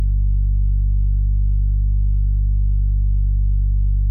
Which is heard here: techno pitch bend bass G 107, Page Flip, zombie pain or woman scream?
techno pitch bend bass G 107